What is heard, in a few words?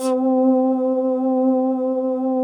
SHINE C3, trance; Best Service Advanced Media Trax Modern Composer